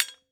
sling impact metal; attack impact; 0ad

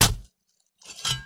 flesh stab, attack impact; 0ad

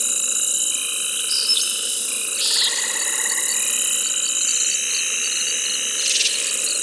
Night Loop, nightsong, ambient; 0ad

